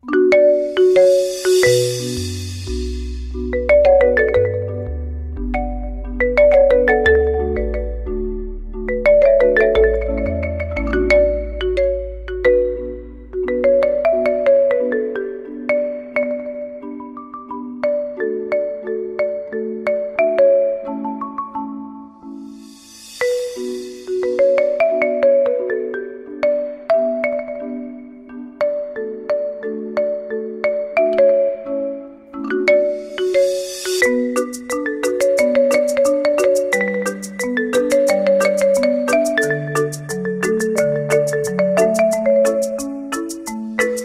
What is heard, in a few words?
marimba remix